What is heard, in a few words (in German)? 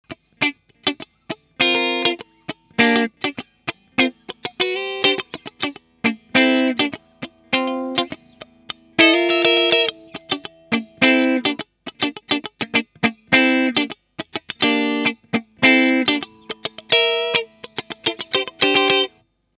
Harley Benton TE 70 Mitte clean